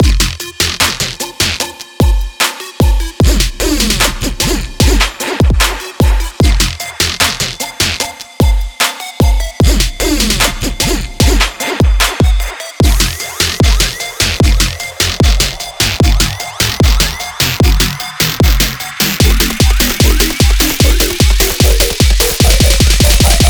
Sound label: HYBRID TRAP; Mutuate